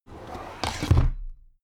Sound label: drawer cabinet crammed close heavy